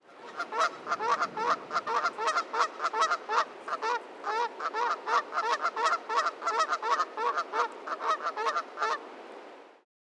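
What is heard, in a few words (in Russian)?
несколько гусей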